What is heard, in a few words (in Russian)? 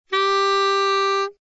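саксофон одиночный короткий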